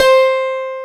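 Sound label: acoustic piano C5; Greytsounds; Scott Peers Sound Engineering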